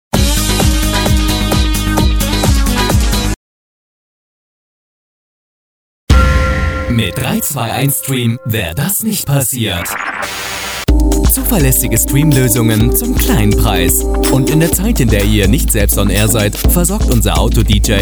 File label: jingle